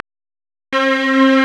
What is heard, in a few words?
STRNG; CMP - Analogon